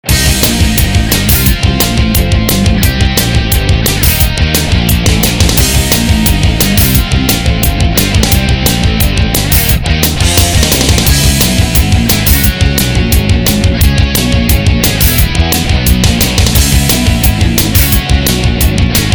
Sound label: chopper cash free spin medley